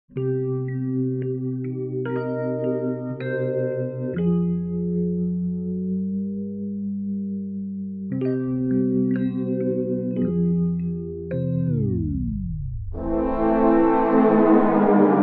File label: Glissando